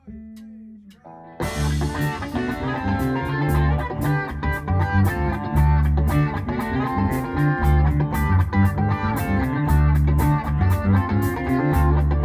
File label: Funky Part